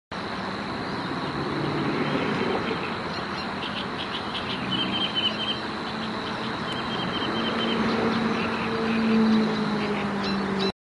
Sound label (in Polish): brzęczka; zakole wawerskie